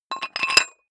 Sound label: dumbbell thread put on weight quick; sport freizeit; fitnessstudio